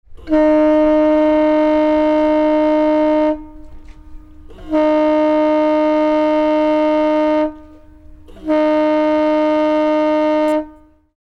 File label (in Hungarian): hajókürt